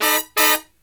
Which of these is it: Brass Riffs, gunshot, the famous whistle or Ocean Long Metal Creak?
Brass Riffs